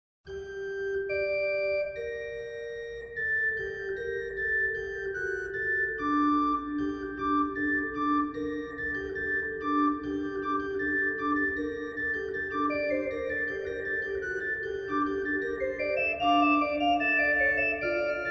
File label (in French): bourdon doublette